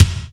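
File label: R8 BASS DRUM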